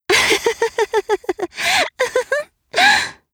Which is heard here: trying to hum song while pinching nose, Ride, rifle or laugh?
laugh